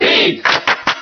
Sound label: Cheer Spanish; Pit; SSBB